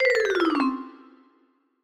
Jingles Smallcat

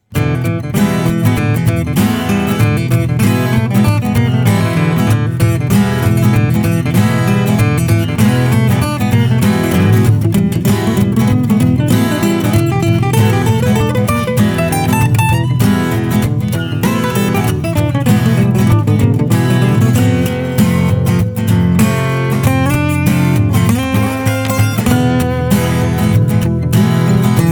Acoustic; Silver Surfer Main Theme

